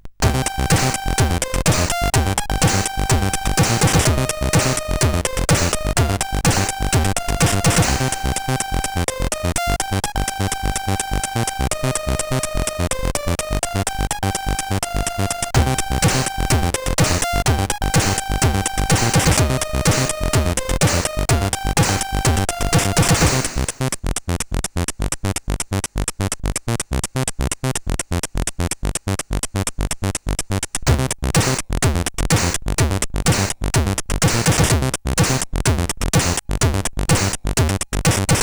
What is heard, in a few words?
sid CSG8580R5